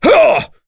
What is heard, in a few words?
Vox Attack; kings raid; voices heroes en